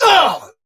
Pain; Warframe; Dax Ashigaru; Battle Barks

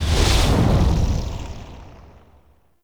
Fire Engulf, Exterior; Warframe; Awakening Remaster; Ambience

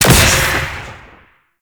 Choir Erupt Proj Explosion

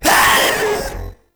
Proto Infested Runner Pain, Vocals; Warframe

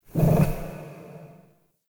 Ambient Meow; Characters; Warframe; Kalymos

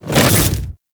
Infested Splitter Melee Swipe; Warframe; Grey Strain

